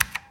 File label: Vintage Keyboard